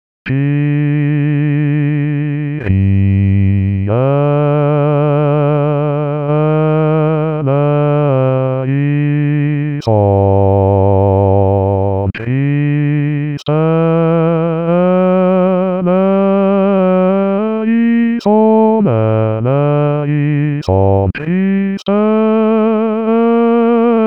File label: Kyrie Bass; Basses seuls; La Messe O magnum Mysteriumde Tomas Luis de Victoria